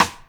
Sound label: Rimshot; Equipped Music; Loungin House